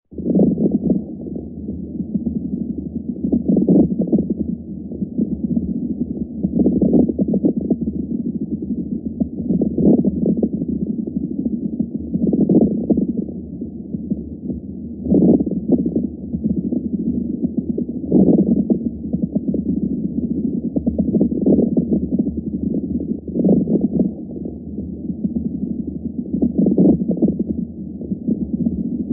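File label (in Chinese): 大湿罗音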